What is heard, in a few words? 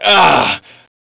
pain, player